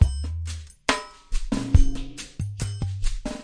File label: 070 bpm super slow ghost rolls, drum loops and breaks